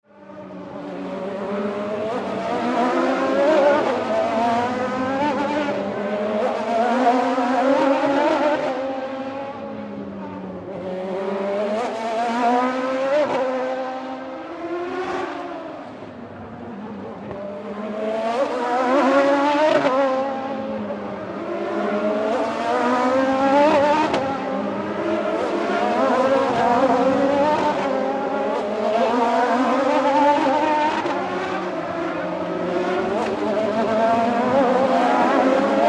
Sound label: Grand Prix Race Ambience Cars Pass Bys; Sports; Racing